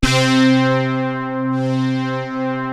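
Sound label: MOOG BASS C2; USB Soundscan vol.49; Analog Fantasies; AKAI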